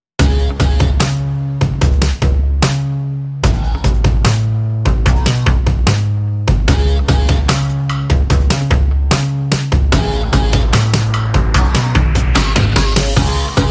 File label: Dark Groove